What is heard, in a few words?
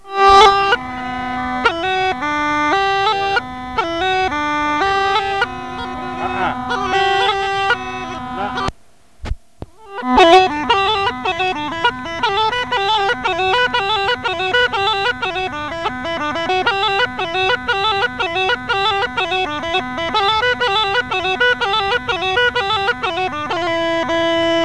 Ornament for sitniji, Rodope Gajada; Lesson Recordings; Vasil - Rodope Gajada Lessons